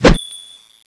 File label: tomahawk xmas slash, weapons